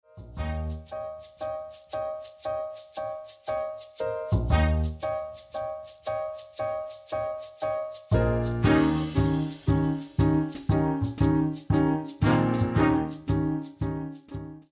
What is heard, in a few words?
Accompaniment